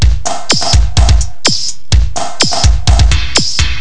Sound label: techno